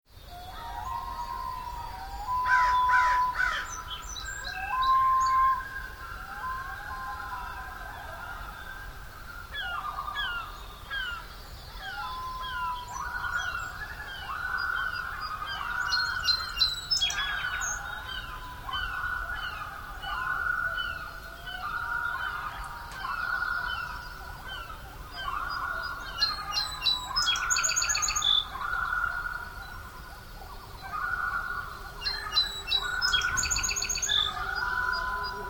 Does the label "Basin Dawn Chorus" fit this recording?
Yes